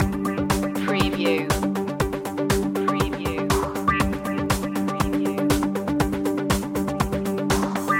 perpetuum mobile loop

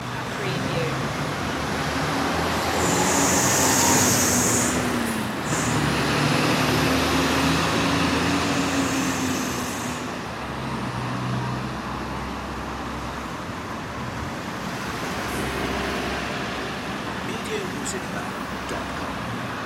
crossroads traffic loop

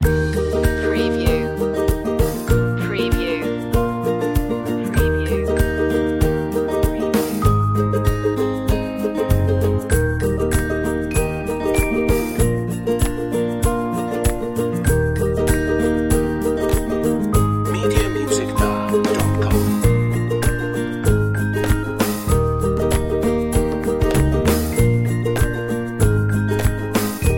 ukulele commercial gentle waves

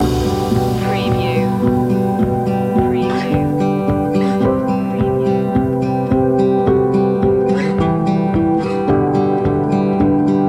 our last night loop

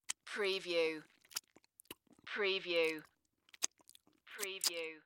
Lick Fingers; Sound Effects Bible

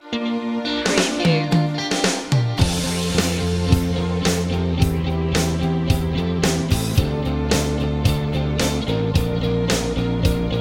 pop

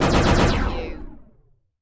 Laser Cannon Four Shot; Sound Effects Bible

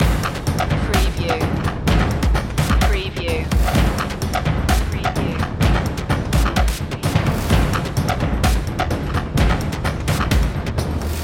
black heart loop

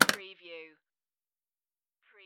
Pinball sound, clank with recoil

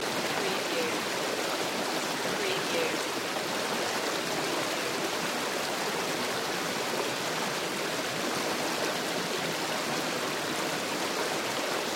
River or Stream Medium

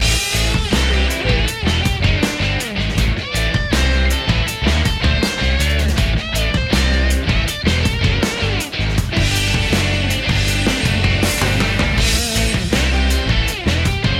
truck power loop

